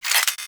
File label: Ship Turret Closet Open; Gameplay; Ambience; Warframe; Corpus Ship Remaster